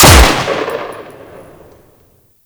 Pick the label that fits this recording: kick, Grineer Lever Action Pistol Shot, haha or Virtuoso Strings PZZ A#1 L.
Grineer Lever Action Pistol Shot